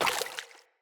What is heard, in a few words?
Grunt Step Water, Footsteps; Enemy; Characters; Corrupted Sproutfolk